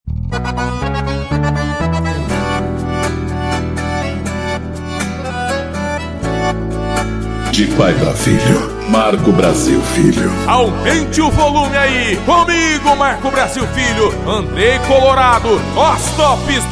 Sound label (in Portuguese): sertanejo com locutores de rodeio